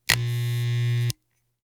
electric shaver short on off switch click

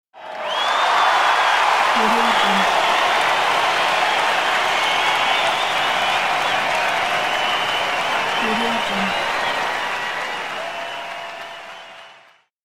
crowd cheers

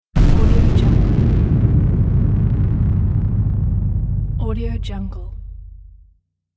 explosion

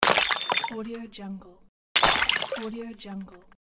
pottery vessel with water smash; tibasfx

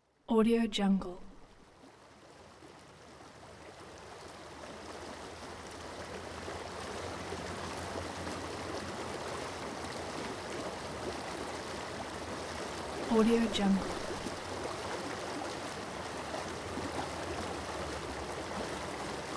filtered water

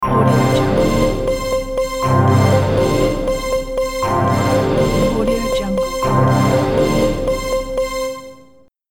news report

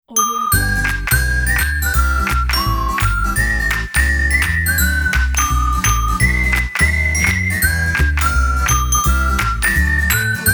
christmas logo pack; drtimwaters

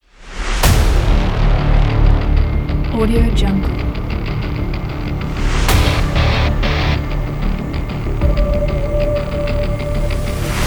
powerful hybrid trailer; loka-music

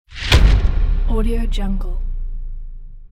slam

